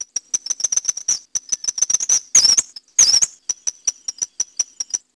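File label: Eastern kingbird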